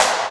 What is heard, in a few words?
SNARE, DANCE